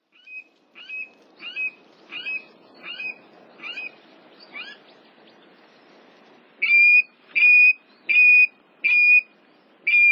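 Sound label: kuina, Water Rail; クイナ; 栃木県渡良瀬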